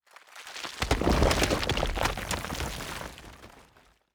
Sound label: quarry dump rocks; Rust